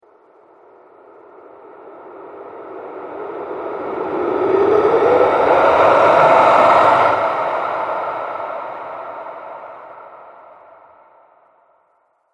Shadow Spirit, ghost; Ghost Sound Effects; horror sound effects

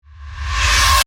Whoosh Reverse, Suction, Accent, Transition; Production Element, Imaging Element

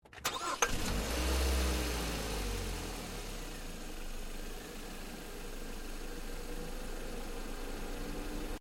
Car Ford Focus Ignition Engine Bay; Ford Focus hire car; Cars; Vehicles

